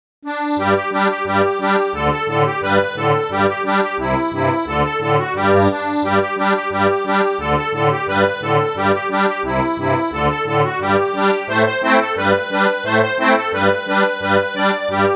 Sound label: folk